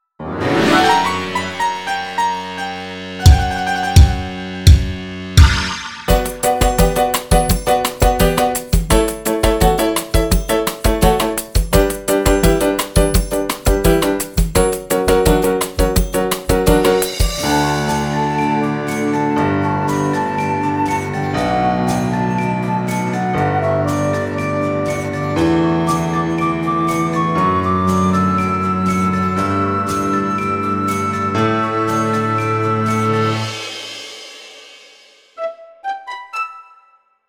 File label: KARAOKE